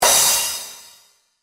cymbal seco

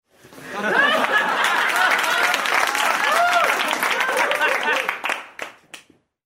risas y aplausos

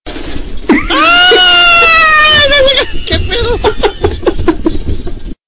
gritando

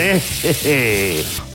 risa; arguinano

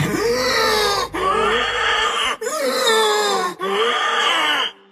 grito; fiddlesticks

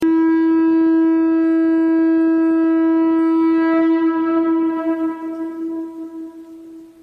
sonido caracol prehispanico

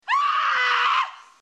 grito mujer

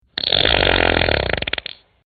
pedo corto

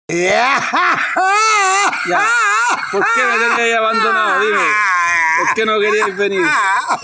risa; joker chileno

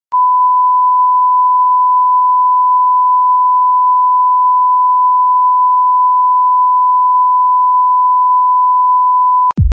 sonido de censura

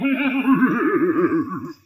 risa malevola

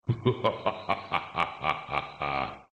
risa; shao kahn

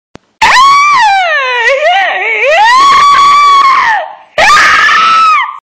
grito

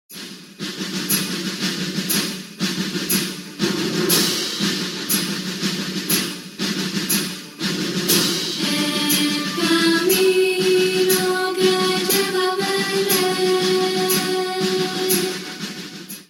el tamborilero

